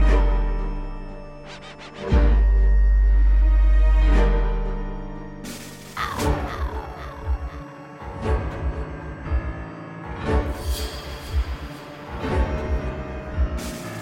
tango, ringtones, latino

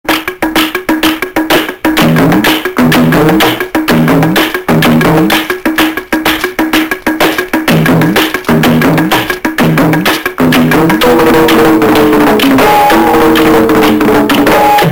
start music tribal; ringtones

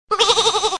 sheep voice; children

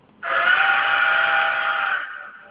train

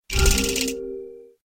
menu sound; ringtones; games; portal 2

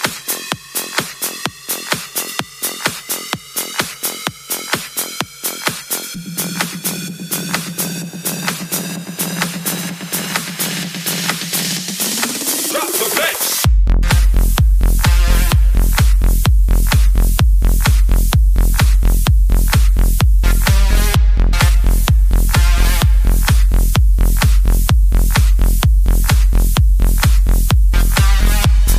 DROP BEATS

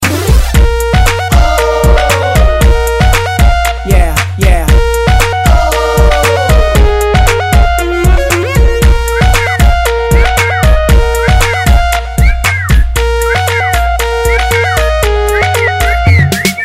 Yahoooo

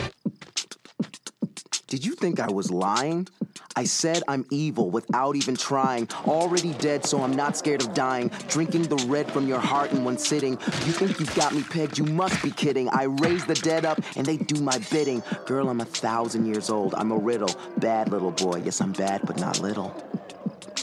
Rap

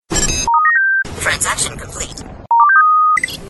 Transaction Complete

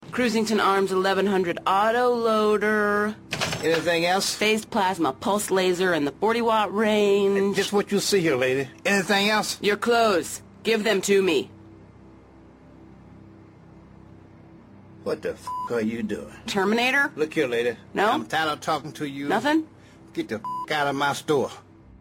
Frisky Jazz

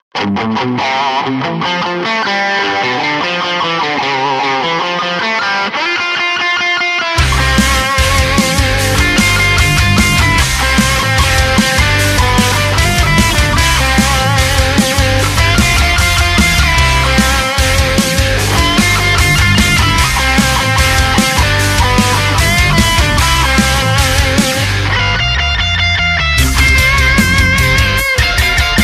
la bumba rock cover

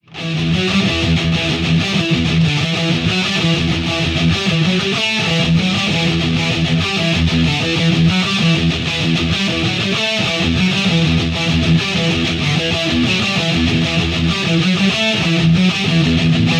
Battery Riff

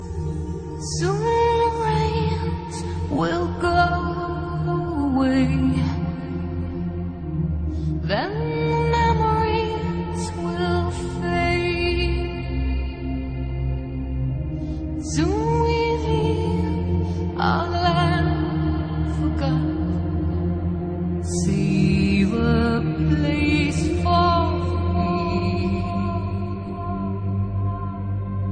beautiful f voice

